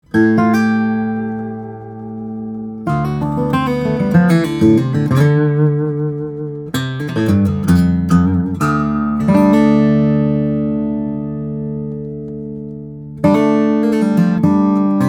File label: steel string flattop guitar; Kim Walker SJ 42 Style; brazilian rosewood; sitka spruce